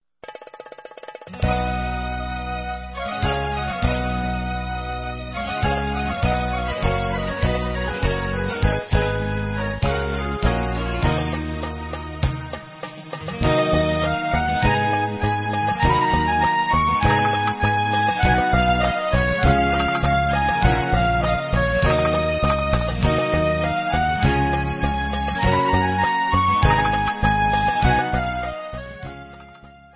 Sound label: Instrumental